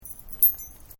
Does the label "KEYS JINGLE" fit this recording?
Yes